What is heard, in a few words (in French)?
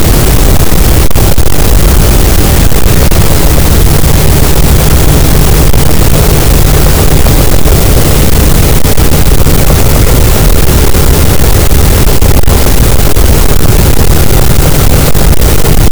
cachalot, Physeter Macrocephalus, gaussian noise